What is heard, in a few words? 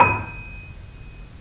The Piano, D#